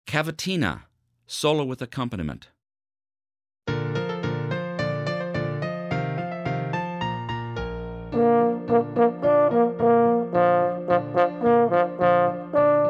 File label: fhorn cavatina solo & piano